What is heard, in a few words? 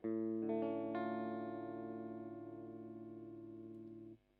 Accord Simple True By Pass